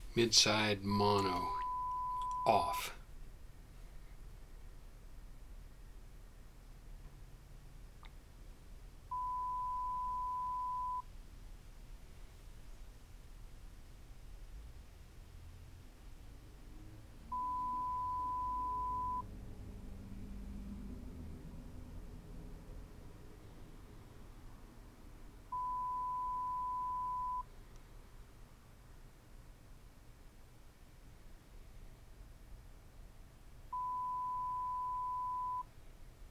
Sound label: Noise Off Rode